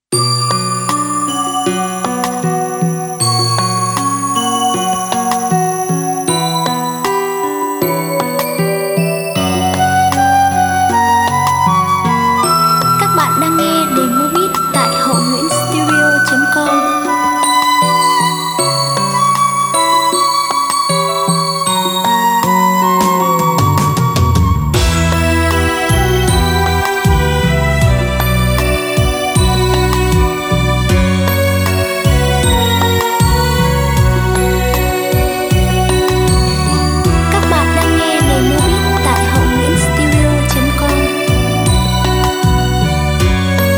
Do chieu beat

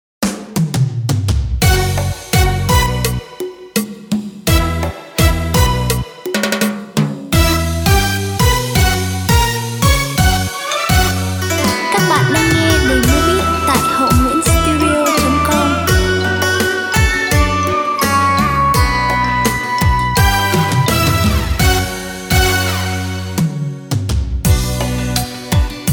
beat

